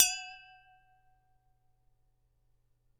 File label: Glass